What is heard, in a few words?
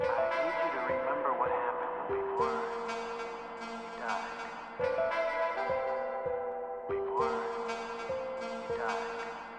Piano Sax Vox Combo, 100 Cmin